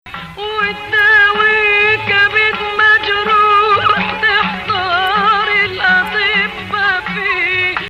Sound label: Rast Nahawand